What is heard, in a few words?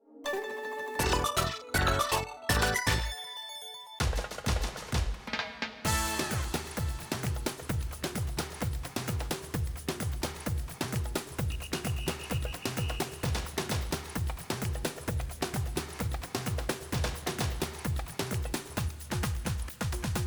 BGM Plaza News Fes; Splatoon 3